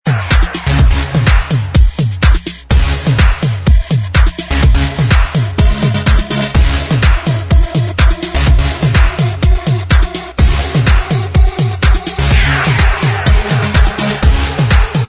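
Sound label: dance remix instrumental track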